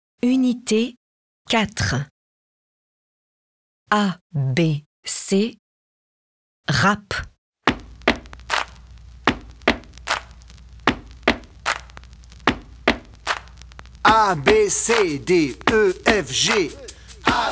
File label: abc rap chanson